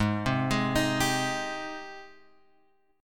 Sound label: Ab Major 7th Ab, Guitar Open G; down